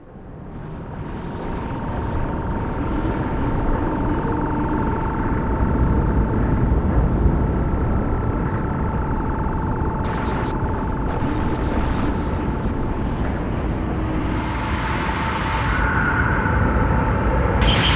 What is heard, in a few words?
die